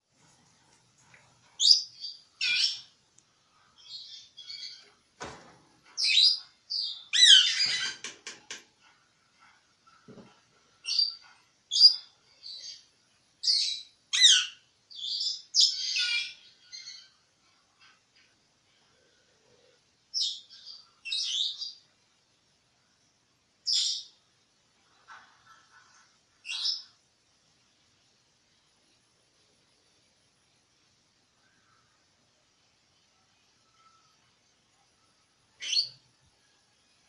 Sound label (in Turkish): senegal papagani sesi